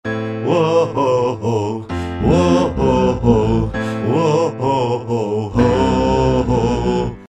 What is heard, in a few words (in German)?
insert oktave tiefer; Verdopple die aktuelle Stimme